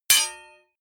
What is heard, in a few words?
block metal small